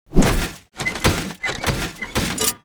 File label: recall lead in; jinx base